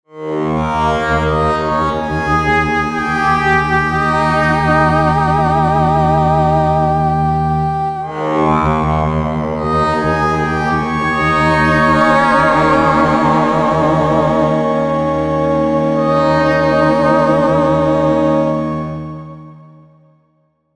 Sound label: Oberheim OB-E; G-Force Software; Talk To Me